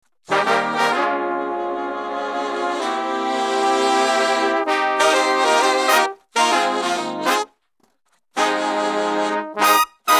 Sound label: brass sect dry